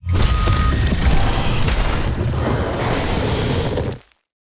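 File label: boss spawn; morsfera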